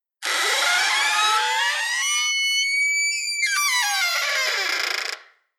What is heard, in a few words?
Long Door Creak Dry Version